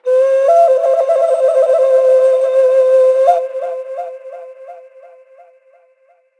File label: panflute; ambient; World of Tanks Blitz; map asia